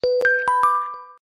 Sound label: xiaomi sms ringtone iphone sms ringtones